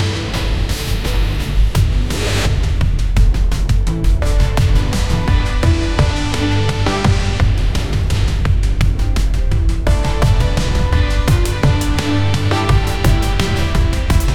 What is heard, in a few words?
epic music with lots of, beats